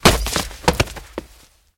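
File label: hurried rustling of papers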